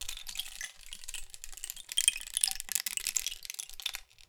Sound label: Rain Stick, Percussion Samples